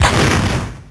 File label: Comb Fire